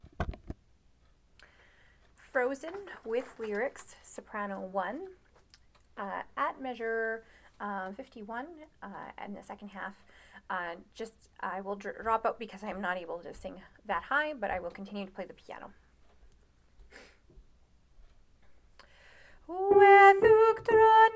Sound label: soprano